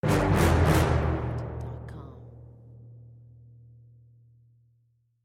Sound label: low dramatic; soundscrate